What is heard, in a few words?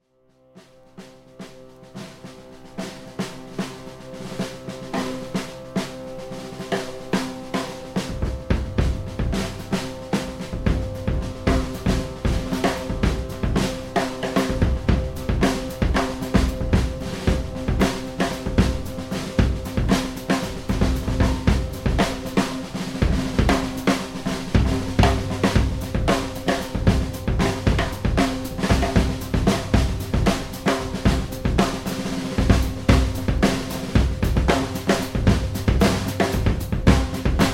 drum and drone; whiplash